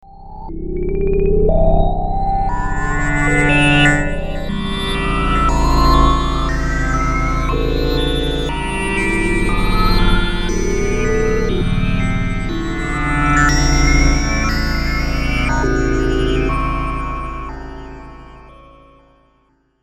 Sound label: Lo Key Ambient